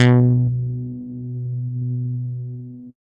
DX Punch; instrumente

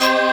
dance chord

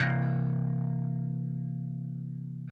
Dist Bell

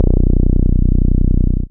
K4 E Bass deep

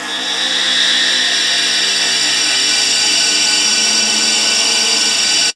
Crying Chimes; instrumente

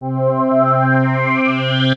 JX Sweep; instrumente

